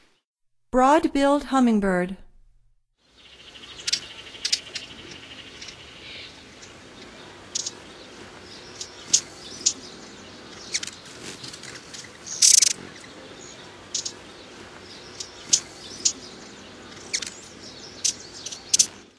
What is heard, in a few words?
Broad-billed Hummingbird; North America; Stokes